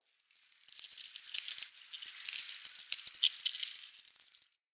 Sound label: Forest Dry Leave Move; Ambience; Midrath Island